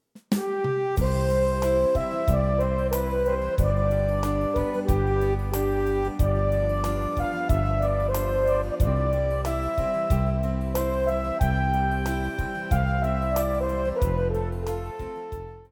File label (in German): horn konzert d